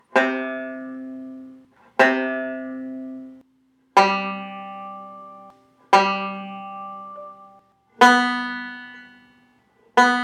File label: tuning, banjo; lessons; intro